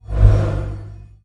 trident glow; smite; poseidon

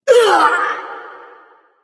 grunt hurt; smite; thanatos

